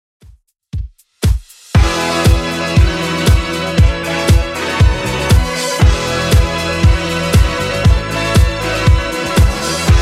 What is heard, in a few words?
70s Redrum 118bpm CLEAN